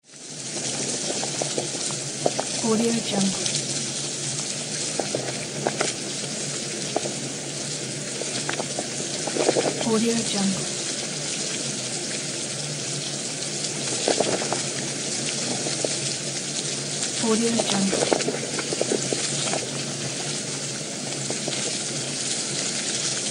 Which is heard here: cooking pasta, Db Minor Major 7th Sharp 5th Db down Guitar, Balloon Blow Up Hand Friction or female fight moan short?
cooking pasta